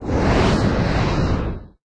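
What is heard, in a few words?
Hit long range fire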